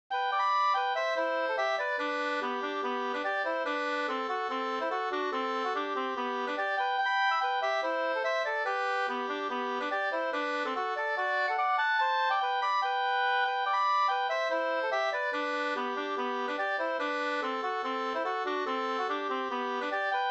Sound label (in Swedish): reinlender